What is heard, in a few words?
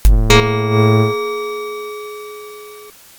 sting; survival sound design